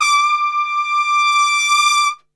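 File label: D 4 trumpet; Best Service; Brass Super Section; TRP SWL; 90 s Sample CDs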